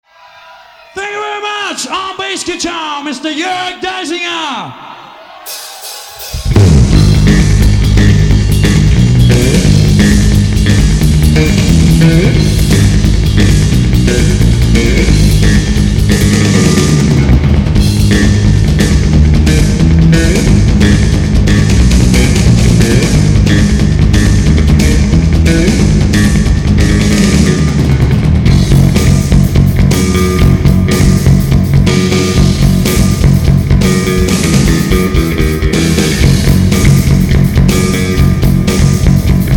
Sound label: bass solo